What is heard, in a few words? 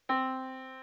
yamaha Disk